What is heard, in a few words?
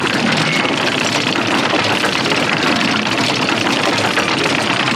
rock lift loop; LoL; emitters map8; odin